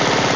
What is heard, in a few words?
uzi